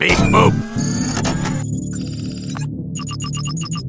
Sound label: robot beep boopt